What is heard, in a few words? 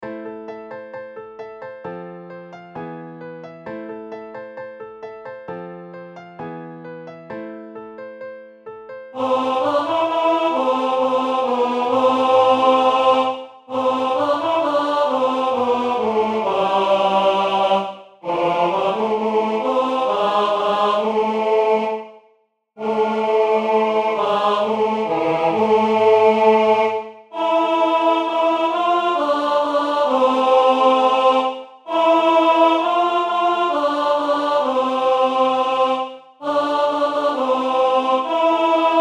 Tenor